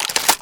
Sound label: shotgun reload